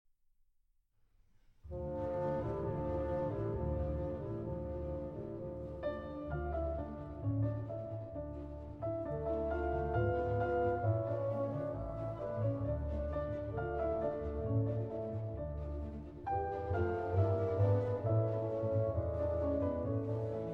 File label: Piano Concerto in D Minor, Allegro ma non tanto